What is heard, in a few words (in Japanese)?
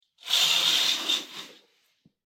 レースカーテンを開ける音; curtain